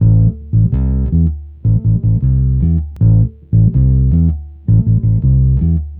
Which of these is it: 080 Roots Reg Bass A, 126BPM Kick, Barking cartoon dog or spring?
080 Roots Reg Bass A